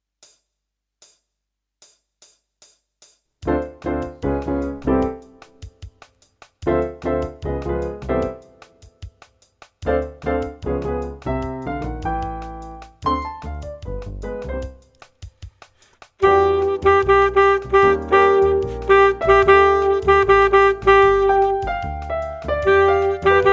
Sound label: one note exam sax